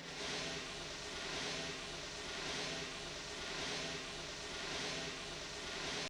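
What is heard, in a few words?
Brush Swirl Low, Electric Snare, Yamaha DTXpress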